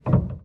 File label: piano release